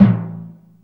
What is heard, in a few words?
TIMPANI